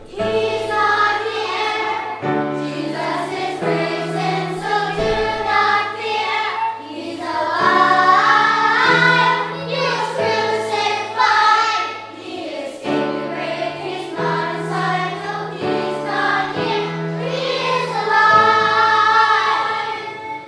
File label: Children's Choir Jesus Is Risen